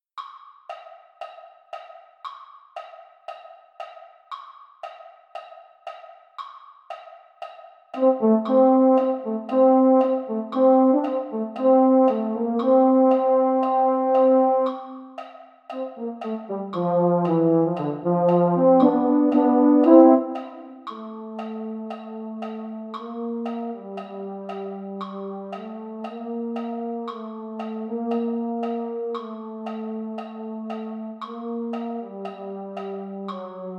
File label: bariitone; patriotic brass